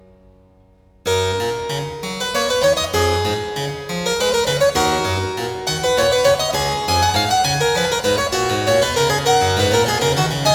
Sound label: Goldberg Variations - Variatio 3. Canone all' Unisono a 1 Clav.; J.S. Bach